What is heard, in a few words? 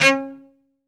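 SOLO cello, Strings; Synclavier; ILIO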